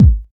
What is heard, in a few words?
tight lows kick drum sample c sharp key